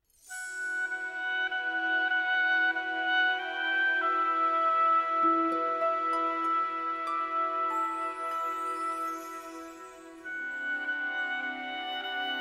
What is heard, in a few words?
Call To Light SATB, choir, Christmas, Candles And Carols